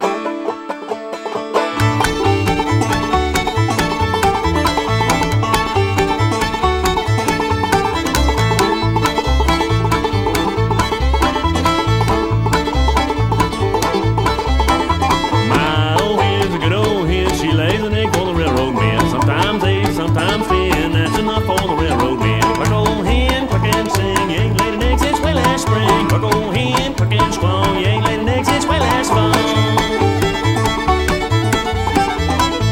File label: Bluegrass